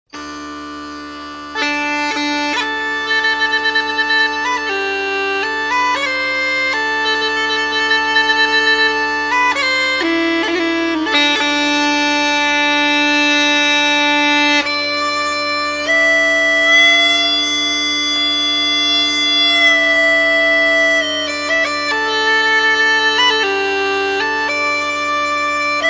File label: uilleann